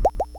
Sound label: pop